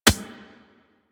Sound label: Beach Ball Bounce Dirt